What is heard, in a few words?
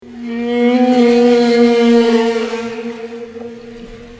hump sing